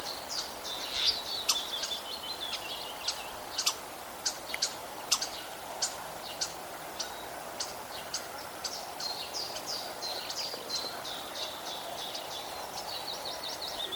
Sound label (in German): star ruf; vogelstimmen; nabu; lars lachmann